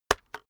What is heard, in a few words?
keyboard external enter hit slow